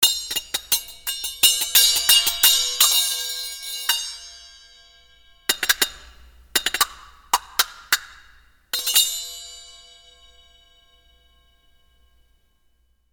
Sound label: Chappa